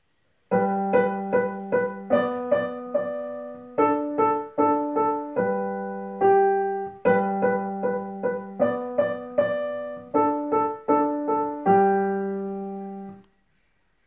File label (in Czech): klavir Presto G dur; D. G. Turk